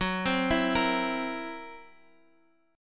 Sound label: C 7th Flat 5th, F sharp